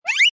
Cartoon Zip, Upwards zip like slip on banana peel.; Comedy/Cartoon; Funny Sounds; Lynne Music